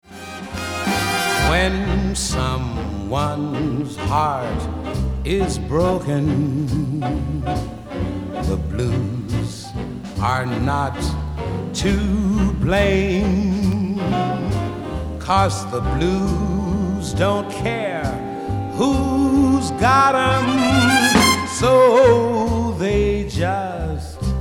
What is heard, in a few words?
Mono Blues